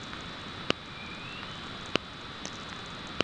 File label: riser, VLF